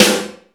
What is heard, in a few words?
sparkly snare drum sound g key